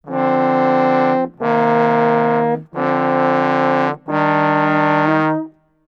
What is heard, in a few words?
90 Dmn7Cmn7Dmn harm, Brass; 90 s Sample CDs; PowerFX - Downtown Orchestra